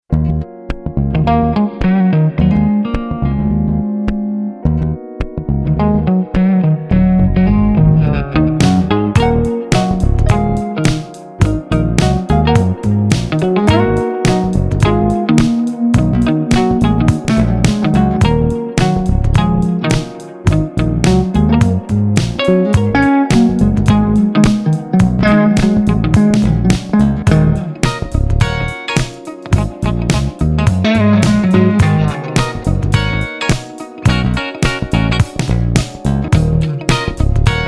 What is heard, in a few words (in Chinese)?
吉他 Electric Vintage; Session Guitarist; Komplete